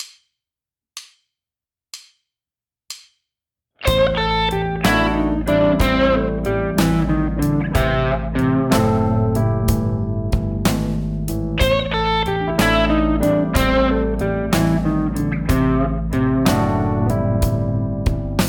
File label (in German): pentatonik gitarre lick a moll